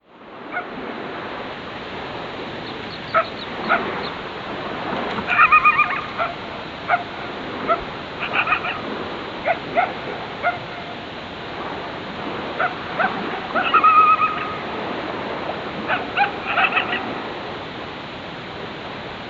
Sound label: Coyotes